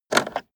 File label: car temperature knob turn short fast